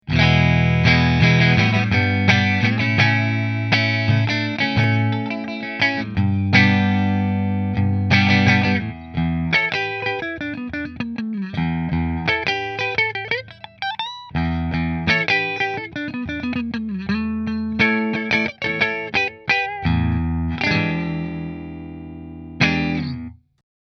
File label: Melancon Middle Mini Position 1 Fender, guitars